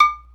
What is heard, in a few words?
Frying Pan, One Shot Drums